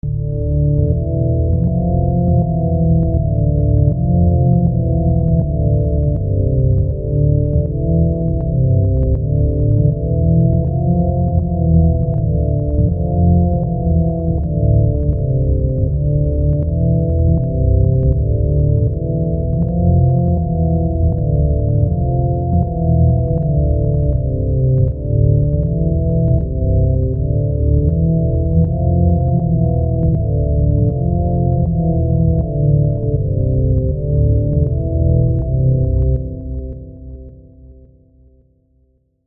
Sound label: Classical Test